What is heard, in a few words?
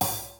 half hat